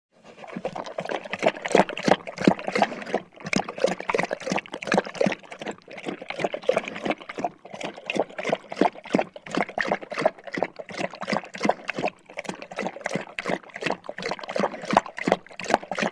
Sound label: Dog Drinks Water from Bowl; Birds And Animals